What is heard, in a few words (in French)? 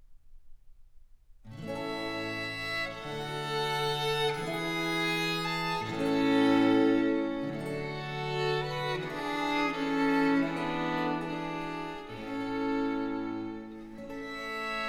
Pièces de violes, Sonata in B-Flat Major; Book 2; Nicola Matteis; Ayres for the Violin; Quatrième Livre (Paris 1717)